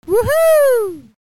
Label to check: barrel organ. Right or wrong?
Wrong